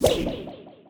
nunchaku whip; weapons; dota; ember spirit

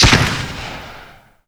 musket attack; weapons; dota; pangolier

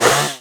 pre attack; weapons; dota; shredder

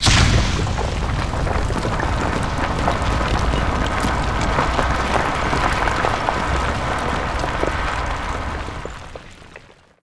shrapnel shatter, sniper; weapons

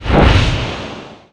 curse cast; dota; silencer

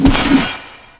hook drag; movement; pudge

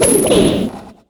searing chains target; weapons; dota; ember spirit

